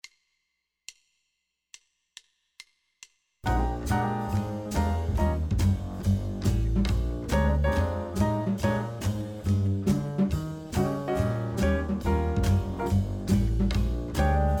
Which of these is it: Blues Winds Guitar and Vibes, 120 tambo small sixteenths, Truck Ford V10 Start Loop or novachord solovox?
Blues Winds Guitar and Vibes